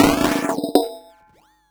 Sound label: Crushed Perc (140BPM); musicradar